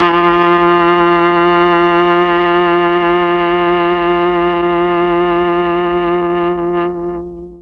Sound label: F2 viola, mellotron